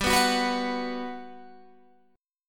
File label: G Major 7th, G, down, Guitar, Open G